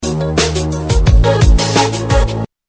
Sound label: aqua theme voice call; Siemens; SG75